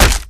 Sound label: railing smash impact; Titanfall 2